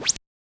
Yamaha DJX 2B, G Pulse & Noise

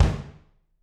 BD, Drums; Bob Clearmountain Drums I

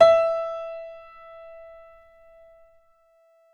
PNO, KEYS; Synclavier Sampler Library

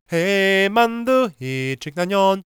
GAELIC, World Voices; ILIO; Vocal Planet

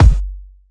Kik; Rawcutz - Nu Forms

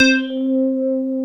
SYN, Analog; Sound & Vision; Gigapack I CD 2

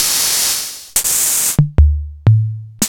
MPC Electronics

